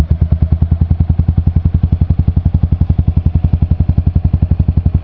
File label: fast idle, Buell; Buell Blast; Recordings Taken from the Saddle; Sounds of the Buell Blast!